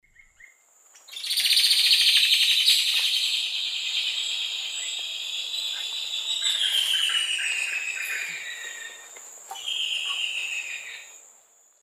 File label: Dendrocolaptes picumnus, alarm call; S. America; Eastern Lowlands